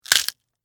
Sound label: Crunch Celery; Texture; Crunches; Objects; BlastWave